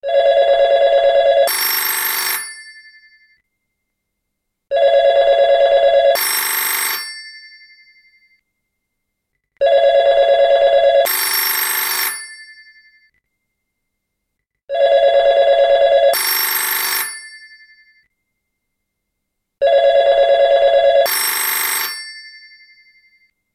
Cell Phone Sound

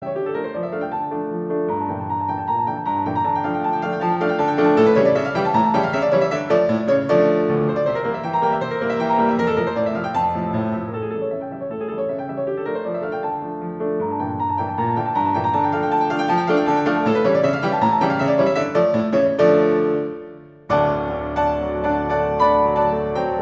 Czardas

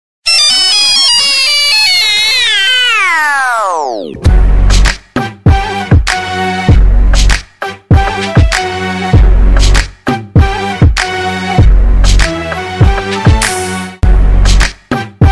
dj call, hip hop ringtones

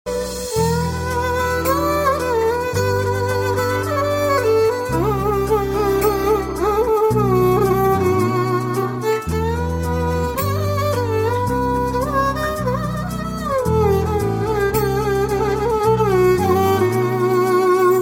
violin

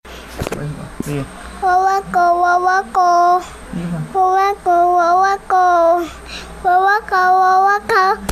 House Happy Wowa

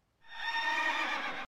Horse

